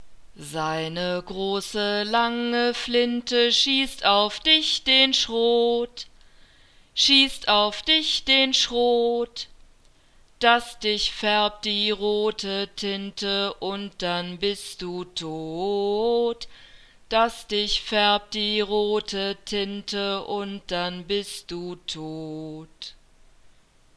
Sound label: nursery rhymes fuchs du hast, German